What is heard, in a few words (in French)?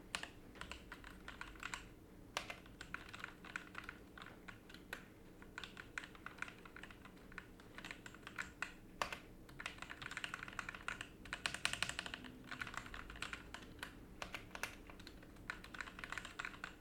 son clavier Corsair K70 Core; test clavier corsair k70 core